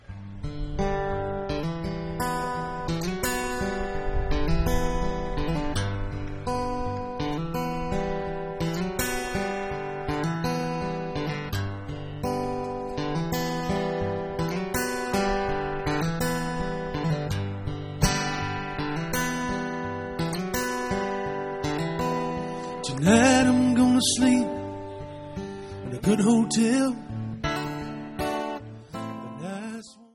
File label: jazz